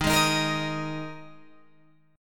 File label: Eb Suspended 4th Eb Guitar Open G